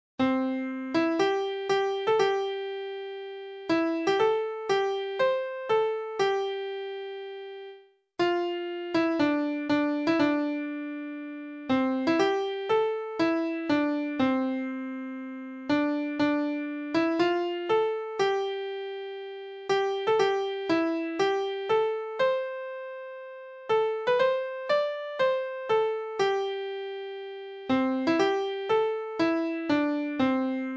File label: piano